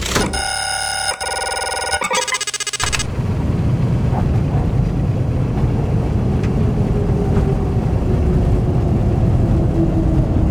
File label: factory Landing, ships Movement; Cosmic Rage; The Experience-light